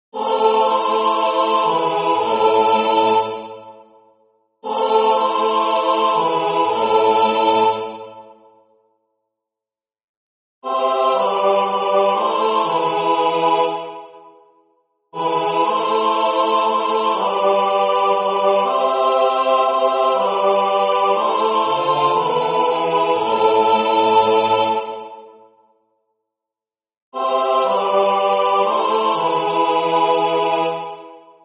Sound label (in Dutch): Alleluja Tutti, koor; J.S.Bach